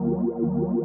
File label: life loop; MagicArsenal